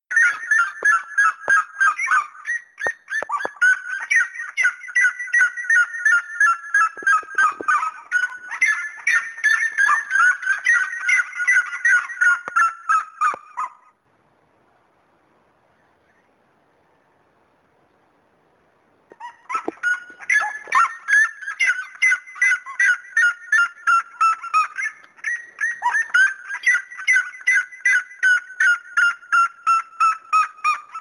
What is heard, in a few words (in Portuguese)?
Canto da Seriema